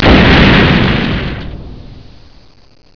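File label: EXPLODE, Explosions; sound effect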